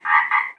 frog, frog groups

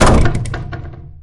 plastic barrel break; physics

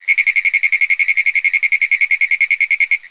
frog long

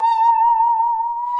saw danger, music, zombat

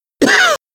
cough, survivor voice biker

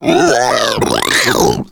female boomer lurk

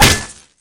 axe impact world, weapons

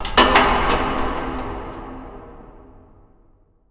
mall random, ambient

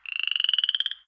small frog, frog groups, random animals

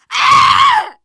death scream, teen girl

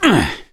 melee swing; survivor; gambler

